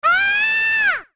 Scream; Romani screaming (being abducted)

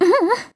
Smirk; Zelda smirking; Skyward Sword

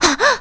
Gasp; Mila gasping (while being followed, and when saying something embarrassing); zelda sounds

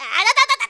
Shock; Link getting shocked

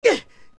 Hurt; Adult Link; Link getting hurt

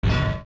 Steps Iron Boots

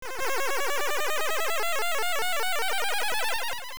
Ocarina Mambo Warp; Warp after Manbo's Mambo

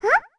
Baby Goron Huh; Baby Goron: "Huh?" (3 pitches)

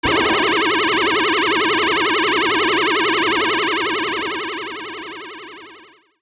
Mirror Shield Reflect Fire; The Mirror Shield reflecting Twinrova's fire blasts (edited to fade out

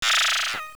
Sword Shoot, Sword shooting magic; LOZFDS

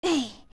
young ledge climb; Link climbing up a ledge

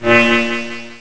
Element Glow; Elements glowing

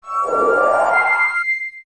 Warp; Warping with Farore's Wind; zelda; OOT

